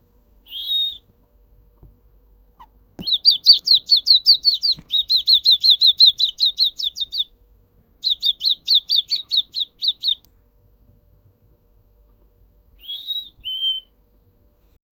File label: a small rabbit that is, animal sounds